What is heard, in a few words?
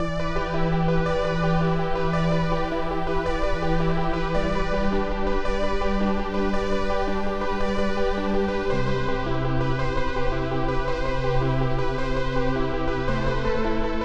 electro; archibald editions